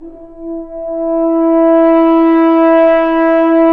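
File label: french horns, swells; Roland L-CDX-03 Disk 2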